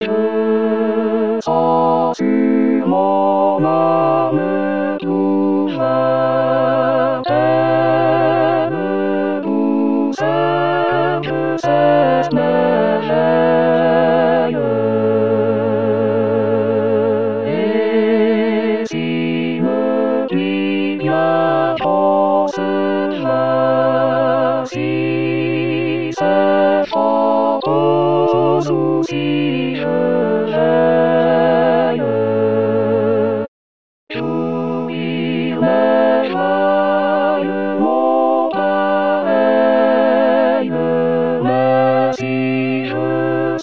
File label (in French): je sens sur tutti